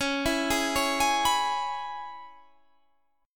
C sharp Minor 7th C sharp Guitar Standard; C#m7; arpeggiated; JGuitar

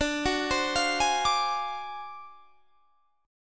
D 7th Sharp 9th Flat 5th D down Guitar Standard; D7#9b5 arpeggiated; JGuitar

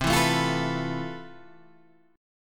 F Major Sharp 11th C

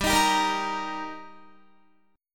G Minor Sharp 5th G Guitar, chord; Gm#5 strummed; 15,18,17,15,15,17

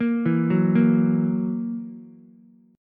Gb 7th Gb down Ukulele, chord; arpeggiated; JGuitar

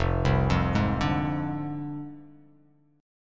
F Minor 6th F down; Fm6; arpeggiated; JGuitar

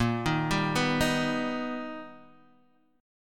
B Augmented Major 7th A sharp

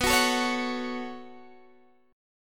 B 7th Suspended 2nd B down Guitar DADGAD; B7sus2; strummed

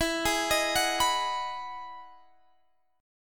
E Major 9th E down

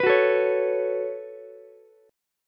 C sharp 7th Suspended 4th Sharp 5th down Ukulele; strummed; chord

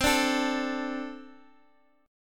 D 5th C; D5/C strummed; JGuitar

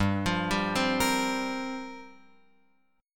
G Minor 11th, chord, Guitar Open F; Gm11 arpeggiated; JGuitar

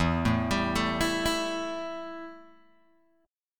E Minor Double Flat 5th E Guitar Open G

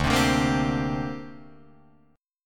D 7th Suspended 4th Sharp 5th down Guitar Drop D

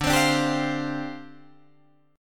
D Minor 11th D down Guitar Drop D

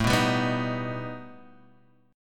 B Augmented 7th A

